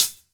studio hi hat g sharp key, foot cymbal tuned to the G# note. Loudest frequency: 6766Hz